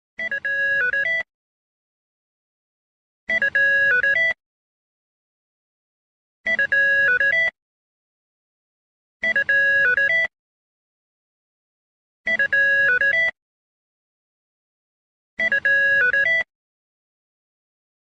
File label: ringtone